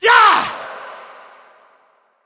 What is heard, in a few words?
Attack; Creature; Bolvar Fordragon